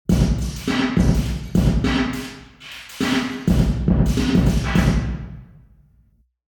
centre drum, central hall; central hall university york

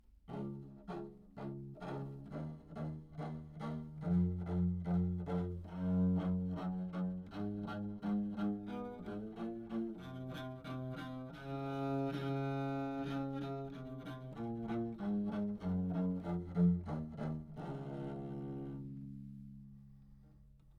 bass viol back mic, Anechoic; OPENAIR

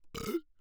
burp; OPENAIR; Anechoic

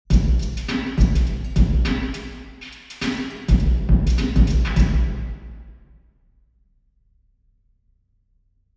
drum, church; St Patricks church Patrington

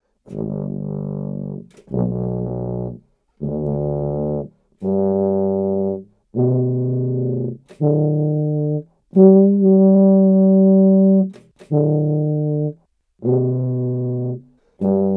tuba arpeggio, Anechoic; OPENAIR; acoustics and psychoacoustics book

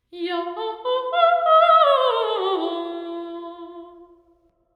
omni sing, monument; tyndall bruce

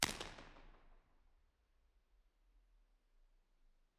tennis court, falkland palace royal tennis court; b-format

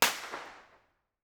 creswell crags; b-format

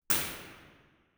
binaural, sound sculpture; tvisongur; iceland model

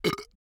burp; OPENAIR; Anechoic

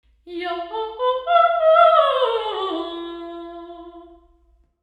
stairwell sing; university york; ortf

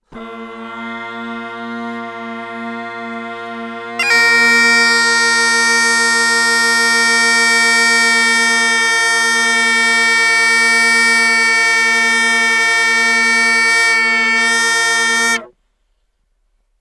bagpipe steady chord, Anechoic; OPENAIR; acoustics and psychoacoustics book

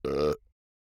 burp; OPENAIR; Anechoic

